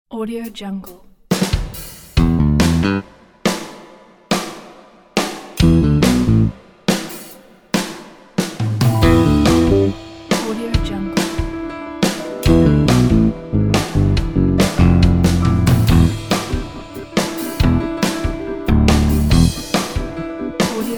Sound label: funny riddle